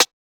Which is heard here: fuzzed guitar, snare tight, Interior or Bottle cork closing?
snare tight